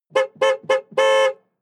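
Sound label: sports car exterior horn multiple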